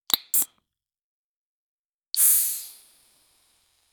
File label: realistic and detailed, beverage